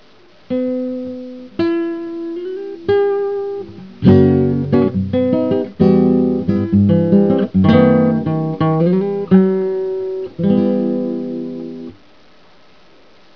CHORO, guitare

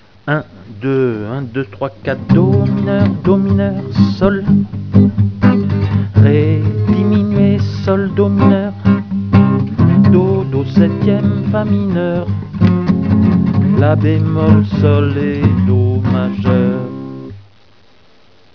CHARLEST, guitare

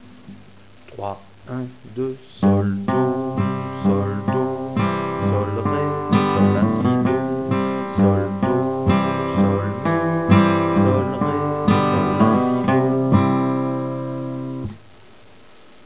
BALLADE, guitare

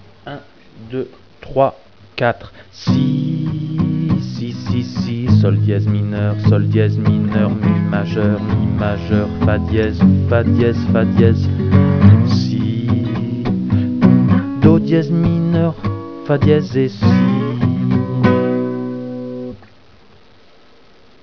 ROCK, guitare

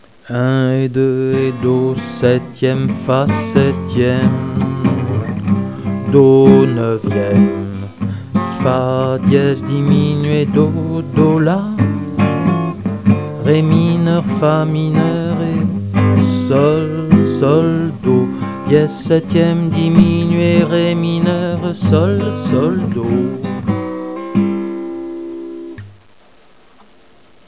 BLUES, guitare

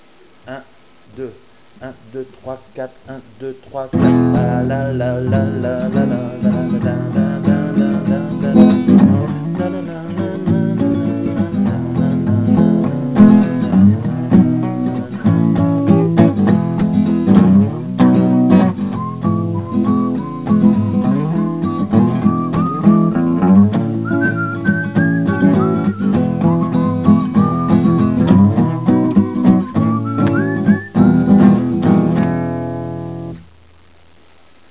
BOOGIE, guitare

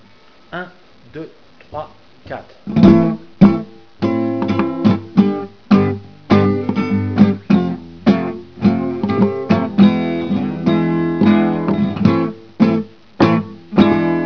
TANGO, guitare

